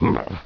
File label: GRNT; orc grunt